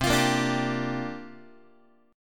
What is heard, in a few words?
C 7th Suspended 2nd Suspended 4th, guitar chord, down; strummed; C7sus2sus4 chord; x,8,8,8,6,6